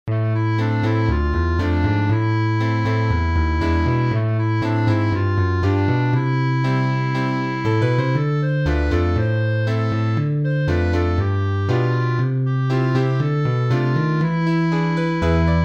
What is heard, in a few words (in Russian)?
evrejskaya muzyka instrumental; Od Yishama